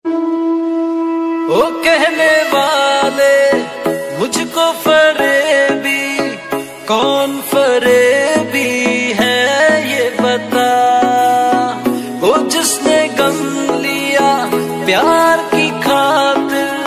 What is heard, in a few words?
Sad Song Ringtone